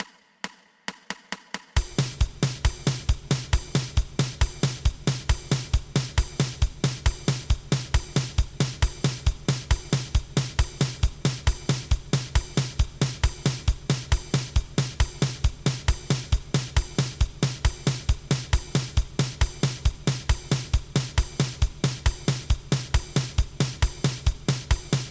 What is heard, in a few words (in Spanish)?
2 pulsos